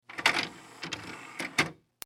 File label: Technology Dvd Player Change Disc; Entertainment System Sound Effects